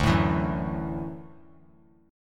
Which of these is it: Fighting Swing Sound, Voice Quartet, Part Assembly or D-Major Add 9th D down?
D-Major Add 9th D down